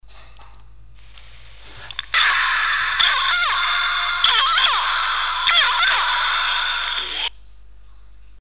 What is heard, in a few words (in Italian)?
cornacchia